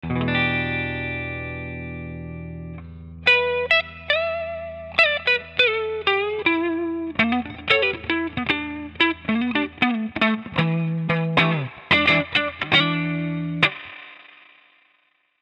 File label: Amplitube Reverb Deluxe